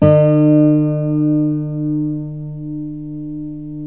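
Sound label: GUILD 12-string, Guitars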